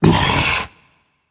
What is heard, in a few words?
ugh rage; chaac